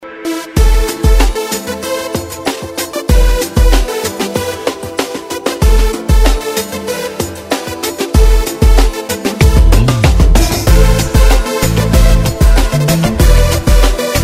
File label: Melody, RingTune